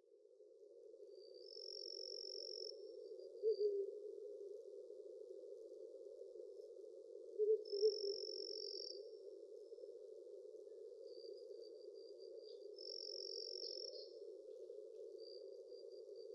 fukurou

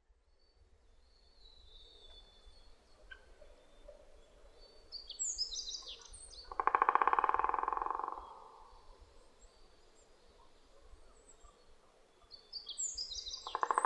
kera drumming

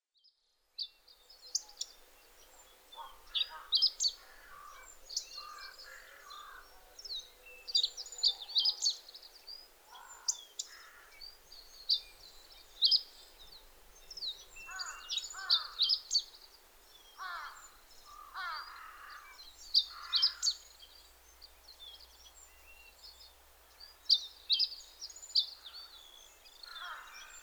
hooaka

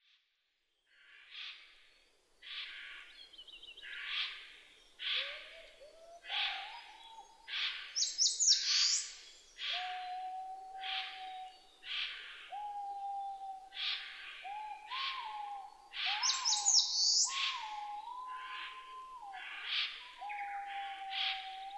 aobato

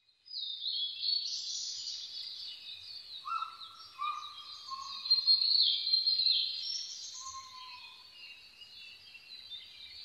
konohazuku

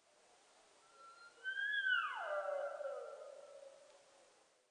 nihonjika

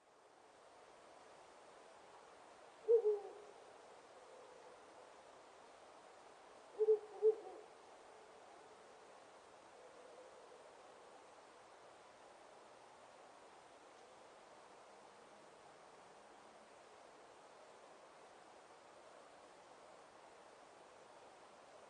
fukurou

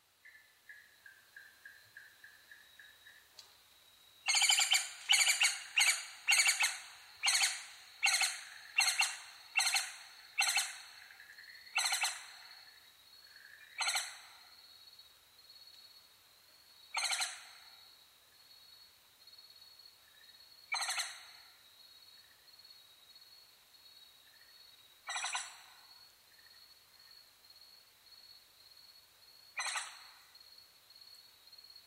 musasabi shureigeruaogaeru

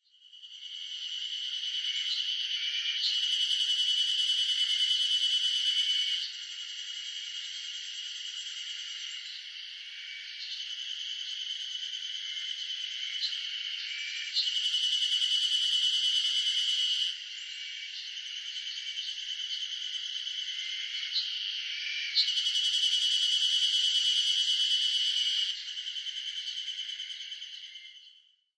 ezoharuzemi

